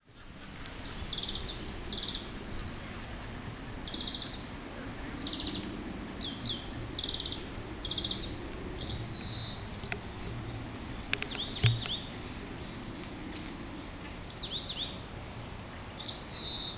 kawarahiwa, bird song; カワラヒワ; さえずり②; 新潟県新潟市中央区 鳥屋野潟公園; J-eco 鳥のさえずり図鑑

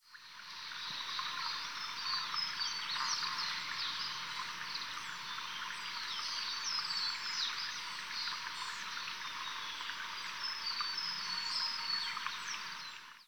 kogara, bird song; コガラ; さえずり; シジュウカラ科; 新潟県東蒲原郡阿賀町川口 森林

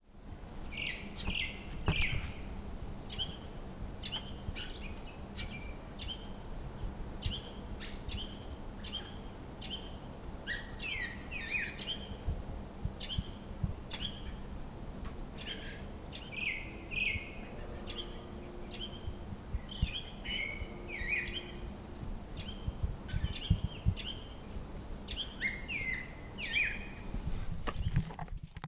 tugmi, bird song; ツグミ; ぐぜり; 新潟県三条市西裏館

